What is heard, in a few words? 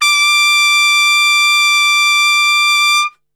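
D 4 piccolo trumpet, Brass; Best Service; Brass Super Section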